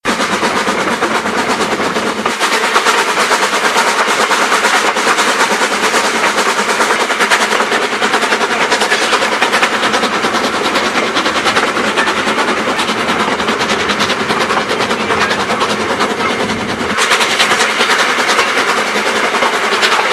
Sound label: exhaust, narrowgauge